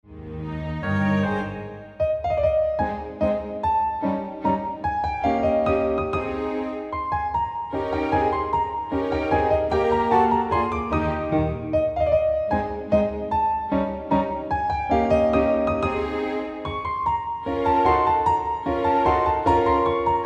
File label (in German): Menuet in As